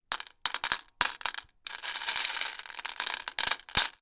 foley marbles drop onto many in glass bowl movement with hand